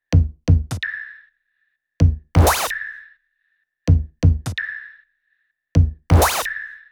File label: 128BPM Kit; Vengeance Samples; Mars Kit